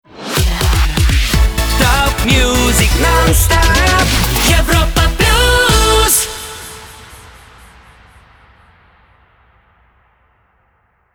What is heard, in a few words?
Jingle; Europa Plus; Shotgun